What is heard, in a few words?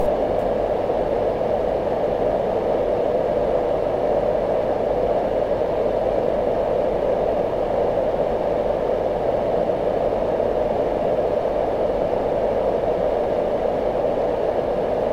Marpac Dohm high speed